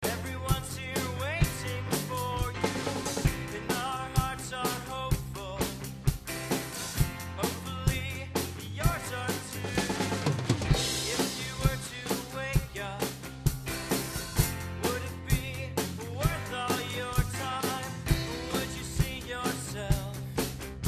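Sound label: drum sample with music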